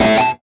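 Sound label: guitar (metal chink); Sonic Foundry (Sony Creative Software); Ugly Remnants VOL-2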